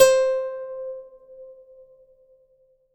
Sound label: Plucked Lute C5; Acoustic and Electric; Polyend; Simulacrum Sounds; 1 Shots